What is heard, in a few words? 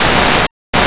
decloak; slender; zerosiki